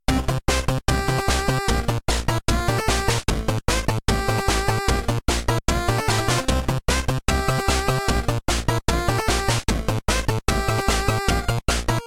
(Chiptune Version); Order Up!

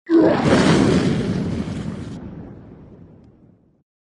Sfx Enemy Dragon Attack

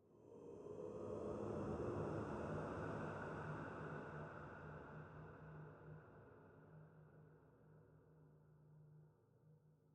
Cave Music, City Music Intro Spooky Wall

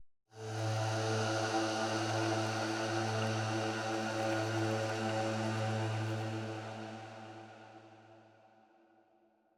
Silence Voice A2; Silence Incarnate

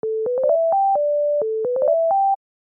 Phone Ring; Off Day; macOS Online Windows

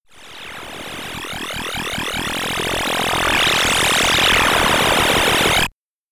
Jingle (SID Stereo); Wizball; Commodore 64

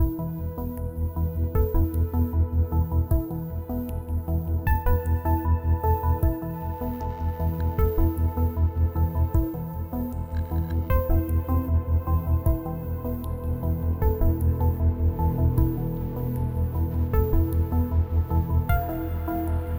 Gridnodes Rezoning 8CH 77BPM

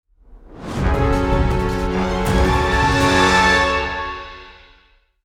Sting; Aces of the Luftwaffe Squadron

